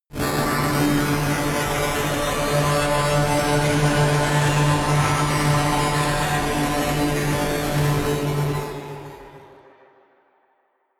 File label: cinematic resonator string; energie